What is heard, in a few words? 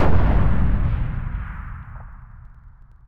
allied sniper distance; WAR